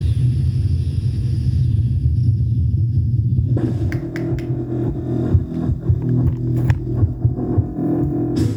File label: Alien amb